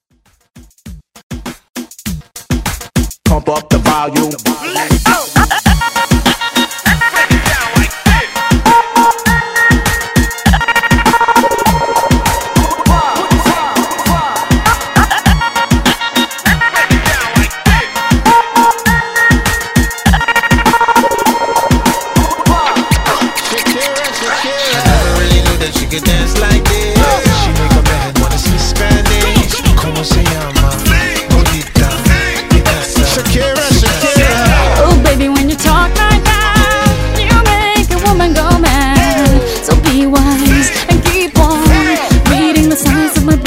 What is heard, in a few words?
moombah to house transition